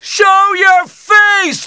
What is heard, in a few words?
LAUGH; BattleSNDS